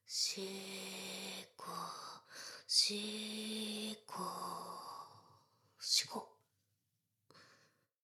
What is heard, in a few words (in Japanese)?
しこしこ, ASMR; ダウナー風俗